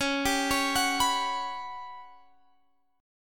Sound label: G Major 7th Flat 5th C sharp